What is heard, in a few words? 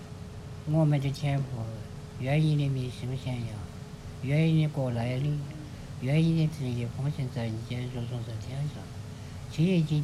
Lord's Prayer mandarin; Cizhong